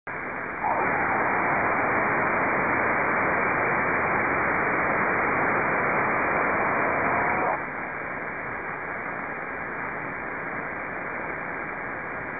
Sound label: Noise